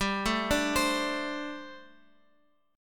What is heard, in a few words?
G Suspended 2nd Suspended 4th G, down, Guitar, Open F; Gsus2sus4; arpeggiated